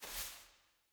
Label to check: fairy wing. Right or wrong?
Right